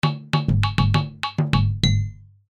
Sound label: Reward Win; Survivor Ultimate